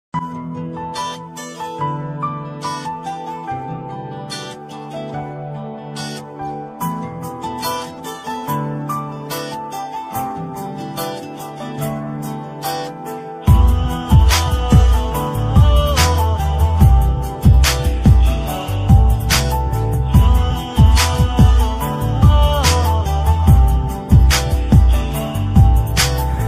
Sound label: Romantic Music Ringtone